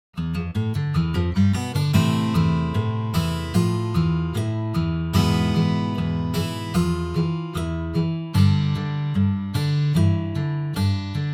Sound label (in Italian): chitarra; Piperno; corso completo di chitarra acustica